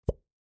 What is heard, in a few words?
Household Plunger Pop